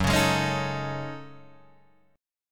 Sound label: F sharp Minor 6th Add 9th, Guitar, Drop D